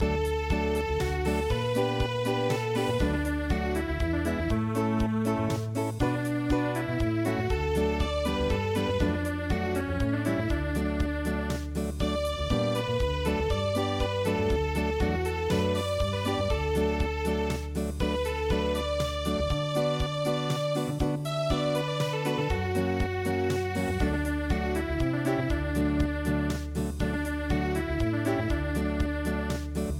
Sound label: Unplugged; traditional